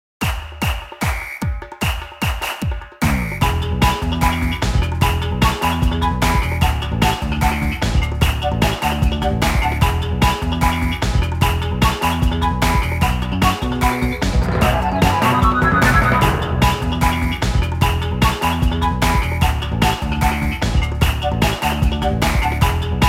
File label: Fire Mario Craft Rhythm